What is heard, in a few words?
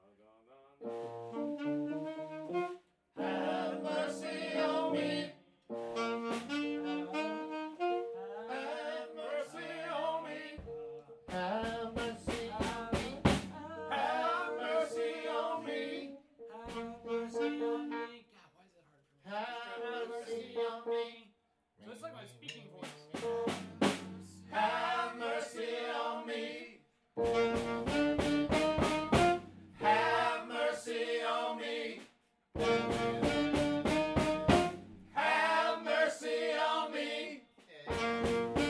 Harmonies